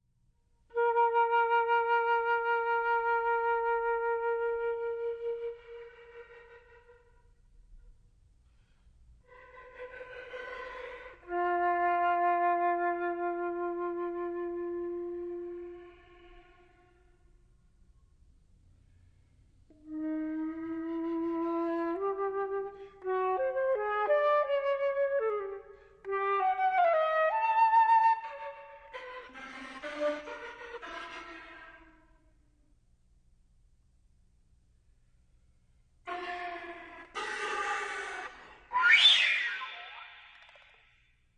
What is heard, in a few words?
chord